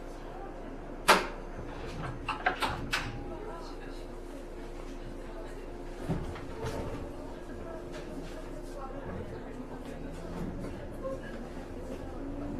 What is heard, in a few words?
shinetsu joetsu ryomo agatsuma 107-100